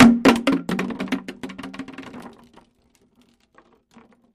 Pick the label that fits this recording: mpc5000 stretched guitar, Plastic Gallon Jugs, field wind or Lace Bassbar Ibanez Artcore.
Plastic Gallon Jugs